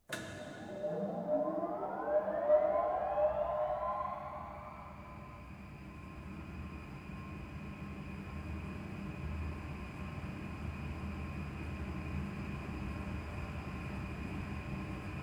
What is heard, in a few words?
Ambience Machine; Machine sfx; Shujinkou